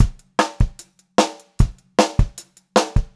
POP BT, Pop-Rock Drumloops; 152 POP

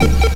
Ragga Noize; Zero-G Jungle Warfare 1, 2 & 3

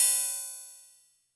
Cymbals, Elektron MachineDrum; SPS1 MKII

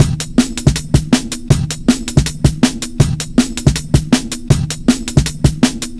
PENNON, breakcore 160BPM

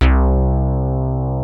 NYNE BASS, Peavey DPM; Rubber Chicken Software Sample Bank

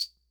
Perc, sr-95; classic machines

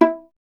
STR VLA, Solo Instruments; Miroslav Vitous; Solo Instruments VOL-1

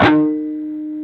PRS PWR E4D, Guitars; PRS PWR CUTS

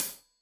Hat Tight, Hi-Hat; Deeply Sampled Drums

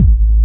TAG KICK, Hip Hop; E-MU Formula 4000 Series Vol. 1; Hip Hop Nation; Laser Tag 100

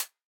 hats E, hip-hop drum kit; 99Sounds x Monosounds; Moon

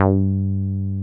303 G3 p; Drums In Your FACE!

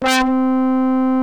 CHRM BRASS C3, RHODES CHROM; Greytsounds VOL-1

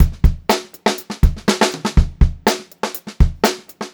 Funk-Groove Drumloops, 122 FUNKY, R

